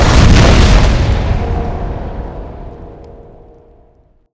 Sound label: piano fall